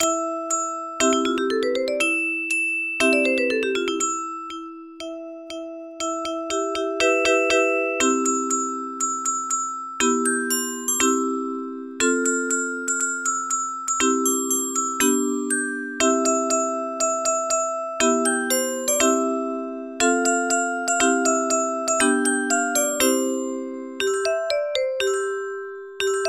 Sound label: jingle bell